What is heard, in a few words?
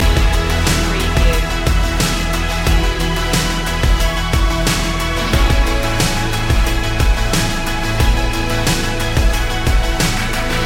spread your wings loop